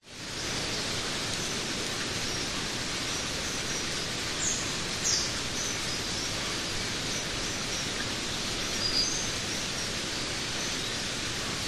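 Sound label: nature rainforest creek tusked frogs slight distance Queensland Australia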